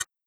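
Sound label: button click; word link2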